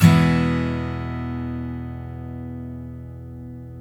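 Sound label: steel E major down, CHORDS STEEL, Guitars; Spectrasonics; Hans Zimmer Guitars VOL-1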